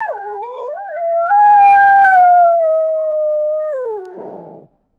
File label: domestic dog whining howl; animals